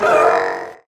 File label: pain; npc; rtrooper